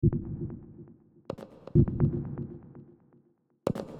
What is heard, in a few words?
Rhythmic (120BPM); musicradar